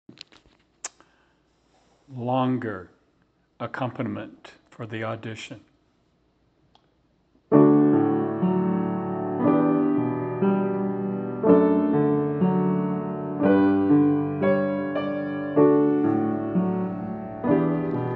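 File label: Longer accompaniment, chorus auditions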